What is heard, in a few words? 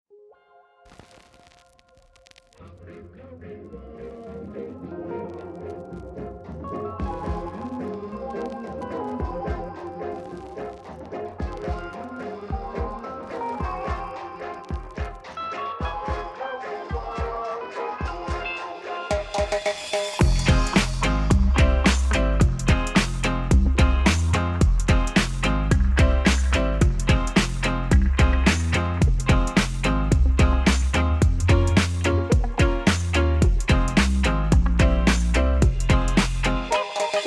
Riddim